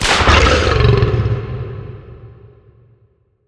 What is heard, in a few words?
whip target; weapons; dota; ringmaster